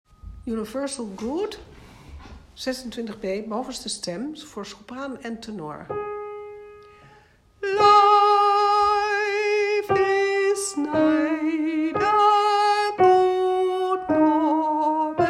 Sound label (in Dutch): sopraantenor